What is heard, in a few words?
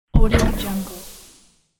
alien geyser deploy; futuristic; شلیک پرتابه لیزری